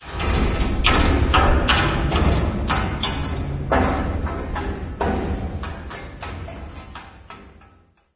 random metal rattle; random amb sfx

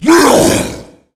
tank attack; voice; Left 4 Dead 2

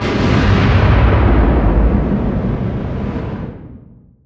distance super heavy battleship damaged attack; Hearts of Iron IV Death or Dishonor

